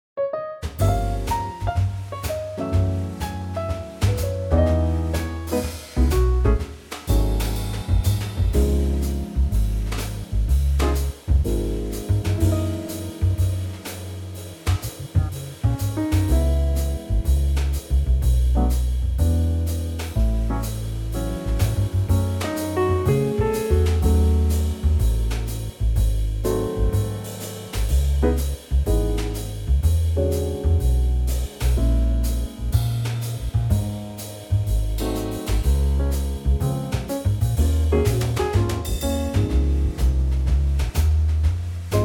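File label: D flat trio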